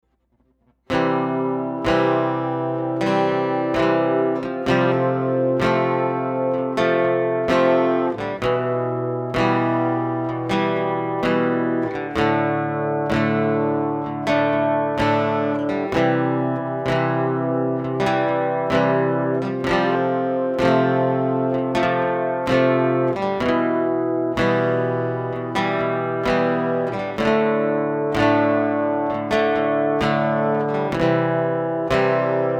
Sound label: sad acoustic